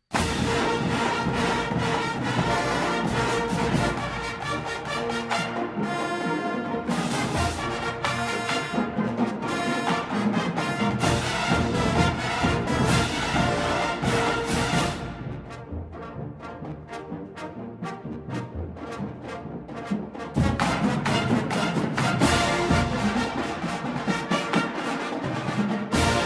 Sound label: Hoedown